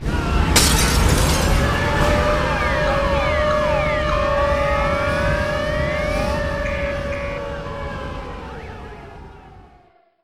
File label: disaster